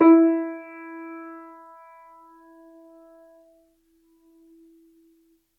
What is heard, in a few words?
Grand Piano, Kawai, Sustains, E3; Chordophones; Legacy